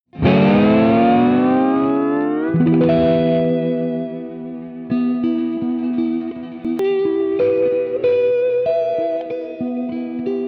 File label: pulse